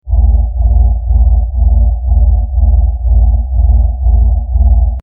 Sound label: cyberpunk city ambience